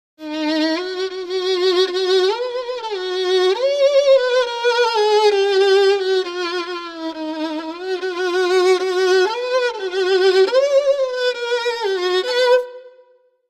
Violin A Little High

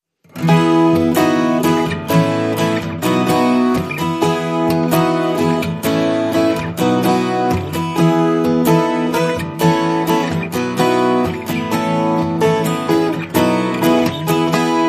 Guitars Only

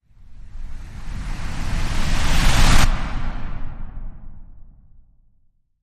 Surf Surge Whoosh

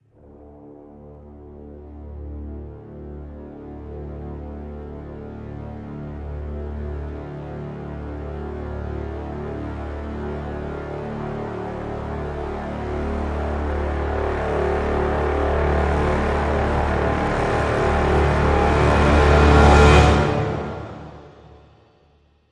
Brass Swell Lo (D); CRES

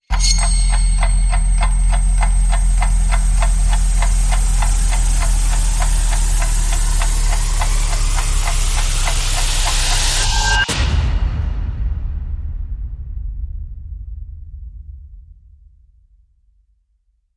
Rise Complex Stress The Clock

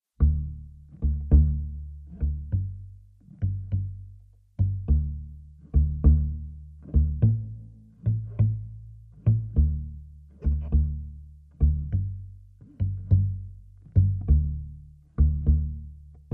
Drums and Bass

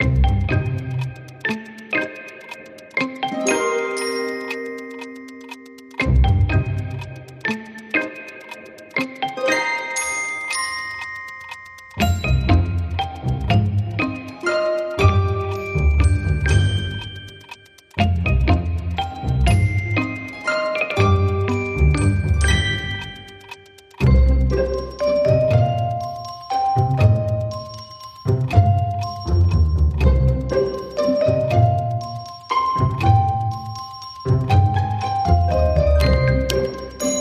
Tick Tock Toy Box

